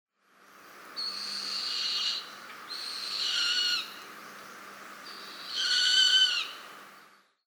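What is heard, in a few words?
Grey-faced Buzzard; utsunomiya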